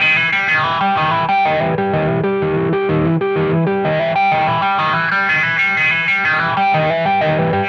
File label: Electric Guitar, Loops; Guitars